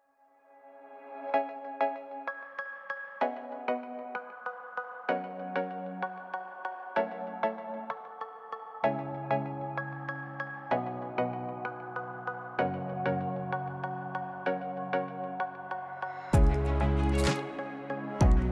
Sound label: digital technology abstract corporate